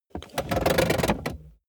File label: car bmw e46 interior seat push back completely rattle